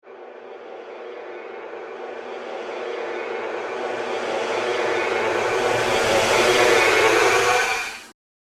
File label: Drone Dark Space, drone sound effects; science fiction sound effect